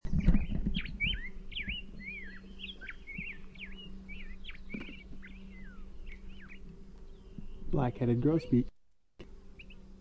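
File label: Black-headed Grosbeak song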